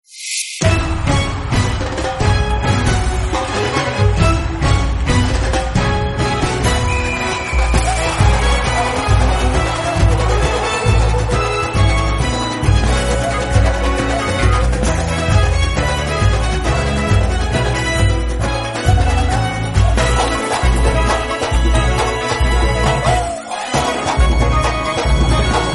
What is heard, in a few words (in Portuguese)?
musica de gol; globo